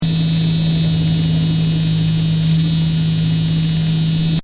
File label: Toothbrush Electric Operate; Loop; Brushing; Human